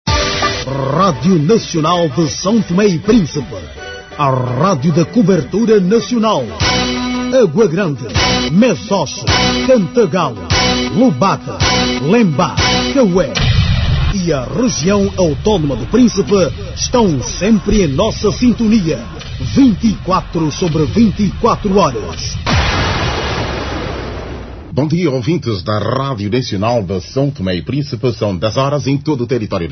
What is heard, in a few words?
Interval signal; stp radio nacional